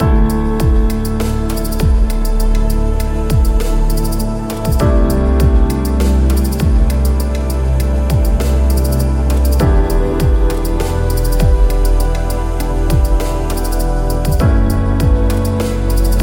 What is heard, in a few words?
Ambient Loop; corporate promo